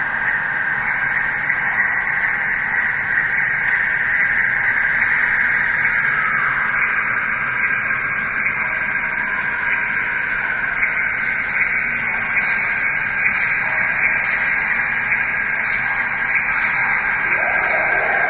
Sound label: cave loop; video game; source engine; portal2; caves